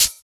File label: Hhat, Hihat; Equipped Music; Loungin House